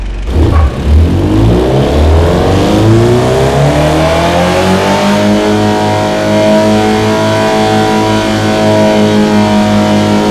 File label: rev, vehicles cars; tdmcars; mk2